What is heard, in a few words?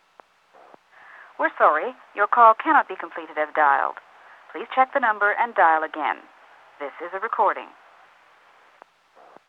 Telephone